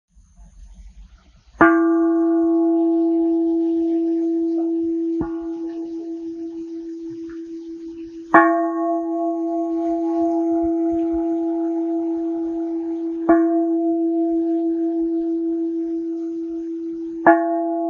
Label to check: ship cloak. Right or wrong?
Wrong